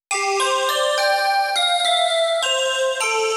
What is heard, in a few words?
synth bell calliope